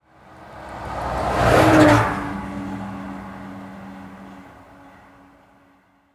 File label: truck pass by; transportation